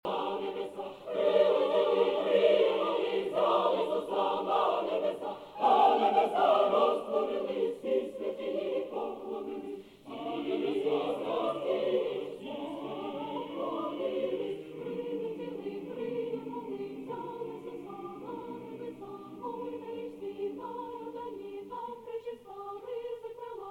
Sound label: symphonic choir and orchestra; kiev ukraine